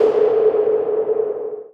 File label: noise fx; Masterbits; Switch FX